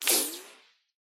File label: bullet ricochet